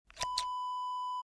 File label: scissors snip; Scissors Snip Wav Sound Effect